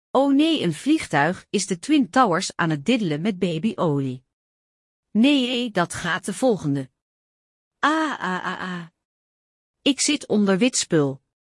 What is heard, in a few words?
aaaah